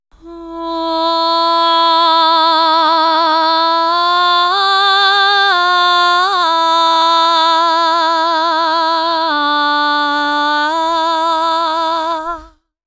Adlib Vocal - Am 65Bpm; Construction Kits; VOCALS; Ghosthack; SkyRealm; Kit 27 - The Crossing Of Jade Sea

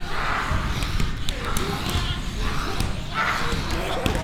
monsters small, Cartoon Film Effects

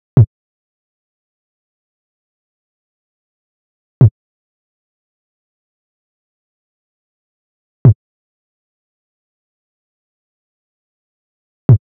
Drum Loop - 125 BPM Tom; Loop Stems; Cymatics; Antidote Drum Loop

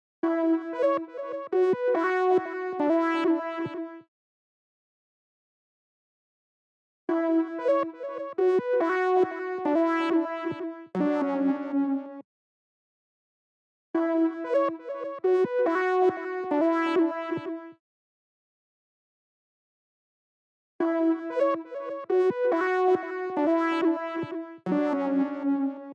140 BPM B Min Lead; Loop Stems; Cymatics; Utopia

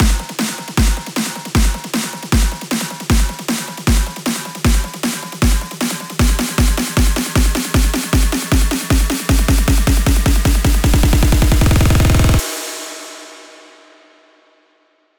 buildup loop 155; GhostHack

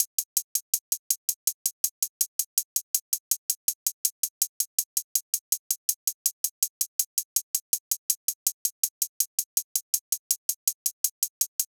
Drum Loop 163 BPM Hihat, Trap; Blizzard; Cymatics; ANALOG EVOLUTION Beta

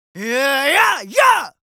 Yells, Viking Vocal

